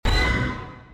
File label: hit